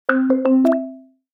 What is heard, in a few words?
incoming